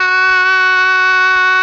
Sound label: OBOE; ILIO; Propeller Island Legendary M400